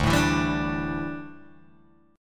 D Suspended 2nd Suspended 4th, Guitar, Open G

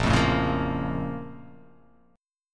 Bb Minor Major 7th, down; BbmM7; strummed; JGuitar

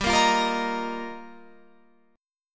G Minor 7th Sharp 5th, Guitar, Open F; Gm7#5; strummed; JGuitar; 19,17,17,17,18,17

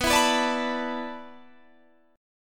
E 7th Suspended 4th

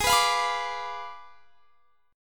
Ab Diminished 7th Ab; Abdim7; strummed; x,23,21,22,24,22

